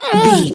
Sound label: voice female hurt pain